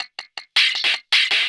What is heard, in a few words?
Loop, Drum & Bass, 160 BPM